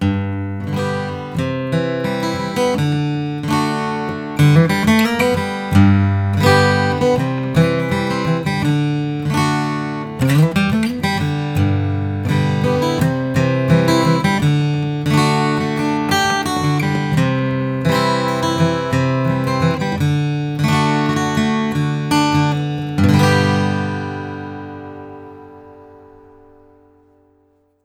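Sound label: taylor ga cocobolo fall limited natural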